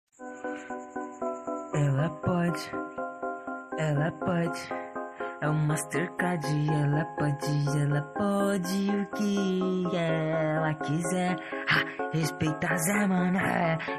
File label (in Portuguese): funk freestyle; trep funk